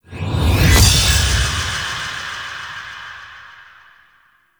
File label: Sword Assembly Pulse; Gameplay; Warframe; Chimera Prologue